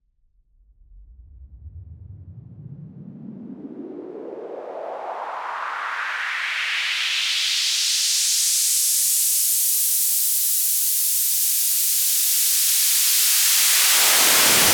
Raise Clean

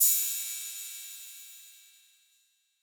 Cymbal

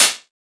Open Hat; FLPRO

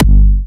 Harder G4, Big Room Kicks

